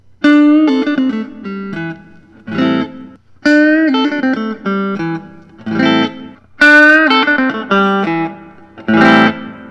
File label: fender custom shop 50s thinline